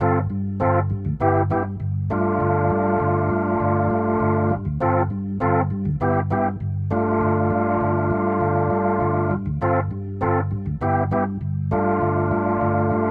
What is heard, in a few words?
Rotor Riff, Organ